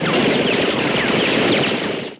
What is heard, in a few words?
machine gun; battle